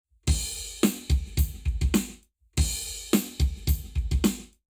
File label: Lab Wet, Drums 0 to 360 deg